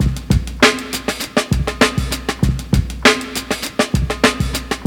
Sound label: TYPICAL, breakbeats; Crypton - DrumBase5000